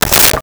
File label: Rotary Telephone Receiver Picked Up; Communications